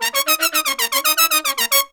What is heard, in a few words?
Pop & Funk Brass, R